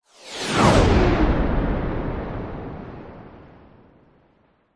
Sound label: science fiction spacecraft flyby whizz past fast; zapsplat